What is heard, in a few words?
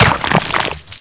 headshot, weapons; cstrike